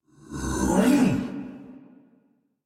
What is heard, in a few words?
Attack Effort; Dialog; Barks; Battle; Heavy; en; Warframe; Dax